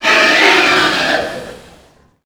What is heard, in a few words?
pig chase; A Machine for Pigs